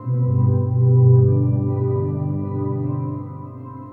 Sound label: Steel Loop, Pastoral; Sonic Foundry (Sony Creative Software) - Friendly Fire